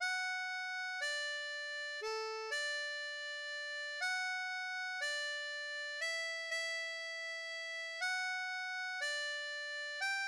Good night ladies, harmonica; Diatonique

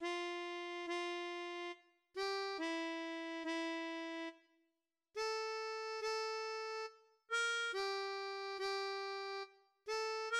Sarabande, harmonica

